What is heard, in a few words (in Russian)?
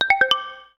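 уведомление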